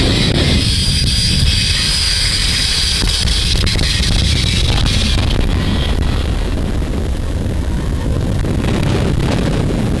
fire; Звук пламени на ветру и что-то вроде ракет вдали

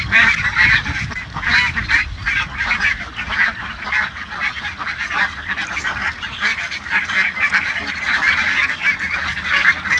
duck; Утка плещется у реки

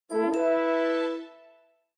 Windows Logon Sound

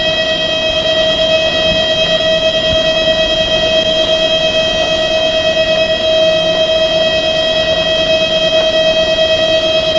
interference; Звук настройки радио

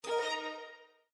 Windows Error

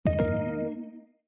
Windows Error

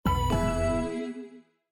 Windows Logoff Sound

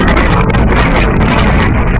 toilet; Пердеж как шум

